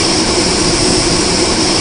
Flange, Train; OpenBVE

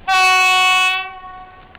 klaxon, Train; OpenBVE; loose stock

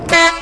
klaxon, class 66 train; OpenBVE

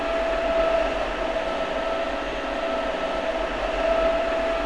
Motor, Train; KCSV7 csatolt

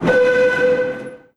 Klaxon, Train; OpenBVE

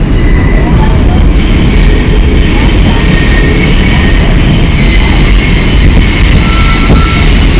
flange, Train; OpenBVE; Jerome Yard

